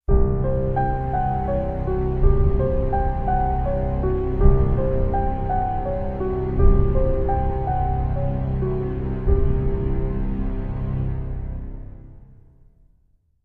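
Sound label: Music Logo Slow Horror, piano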